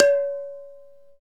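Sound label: percussion tabla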